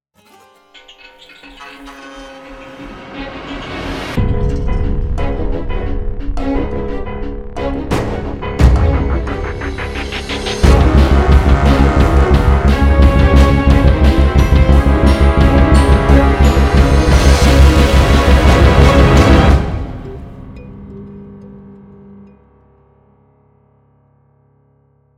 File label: Dark Tunnel Chase